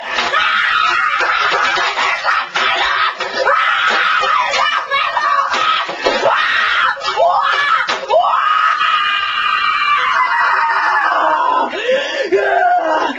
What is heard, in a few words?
scream